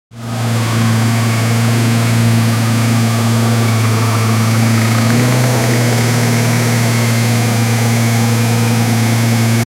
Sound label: cruising speed boat motor; watercraft